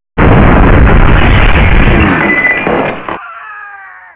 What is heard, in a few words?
explosion